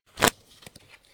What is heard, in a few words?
cards shuffle; dice, cards, game related; NS Studios - ultra sound collection